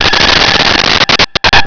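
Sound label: ambience signal horn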